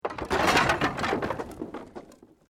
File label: Wood Collapse; Created dramatic effects of buildings collapsing or breaking; Materials